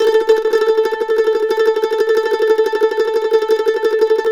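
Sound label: mandolin tremolo A4; Sampleheads; Whole Lotta Country 1 & 2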